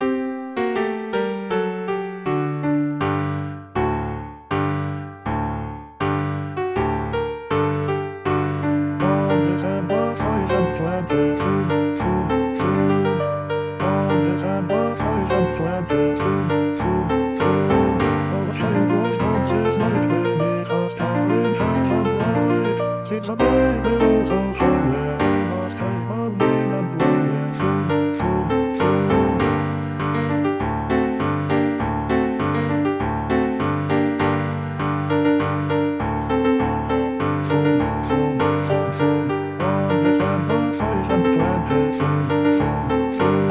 SATB Bass

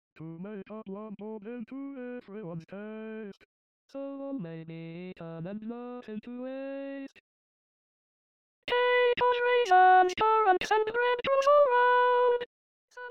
with piano Alto

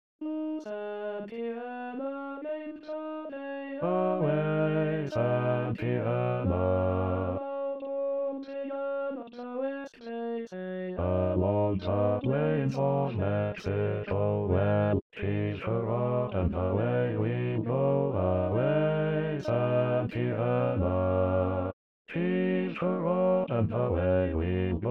bass voice male in B

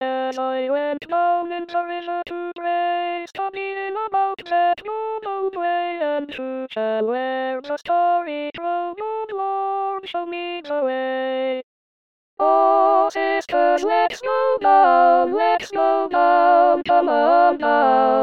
SATB All

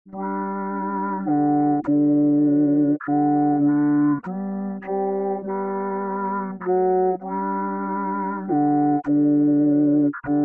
SATB Alt 8ve down all verses

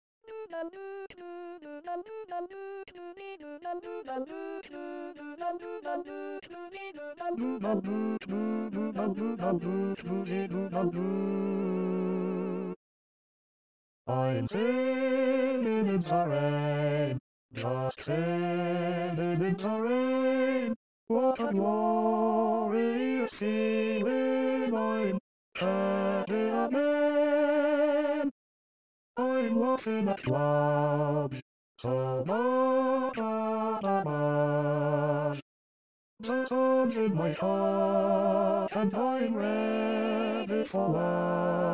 All SATB

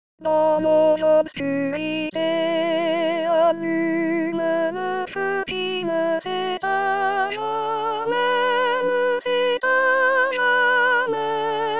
SATB Sop

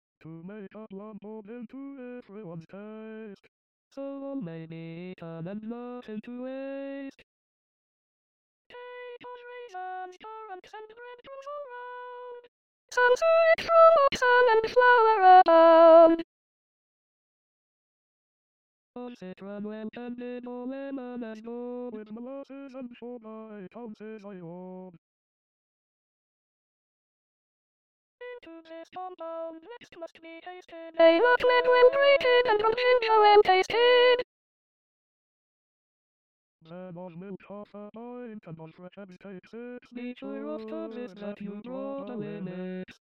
with piano Soprano

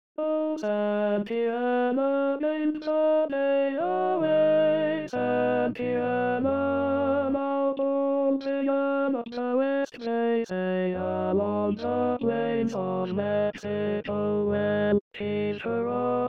tenor voice male in B

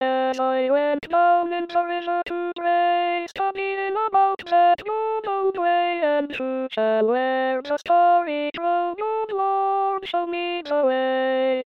SATB Alto

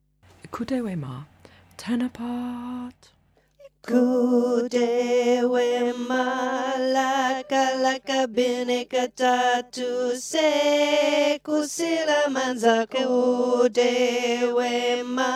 Iqude Tenor

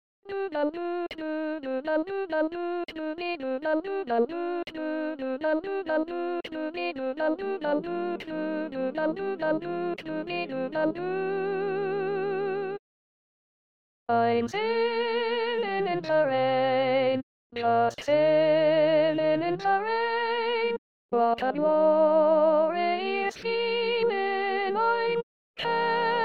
Soprano SATB

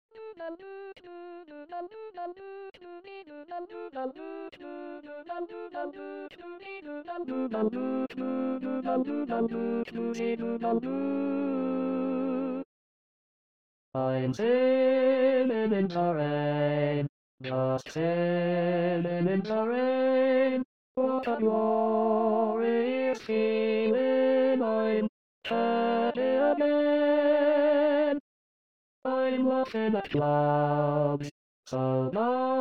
tenor SATB

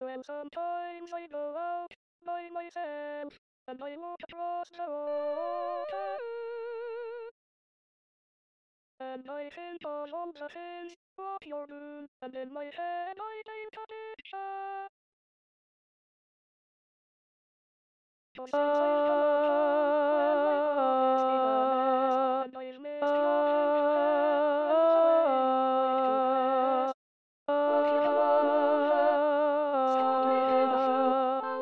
Lead SATB Alto II

